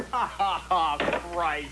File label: Ah Ha Ha